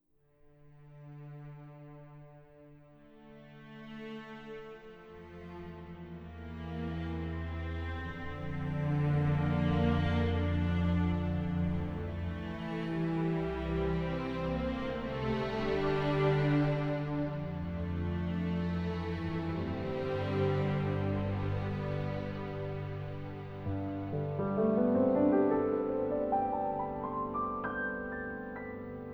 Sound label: Piano string; colonne sonore